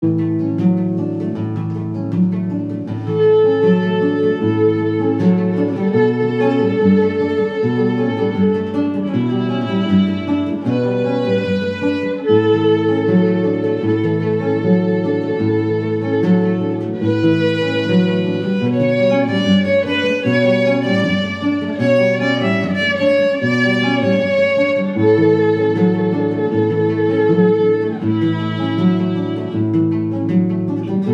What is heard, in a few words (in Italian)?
strumentale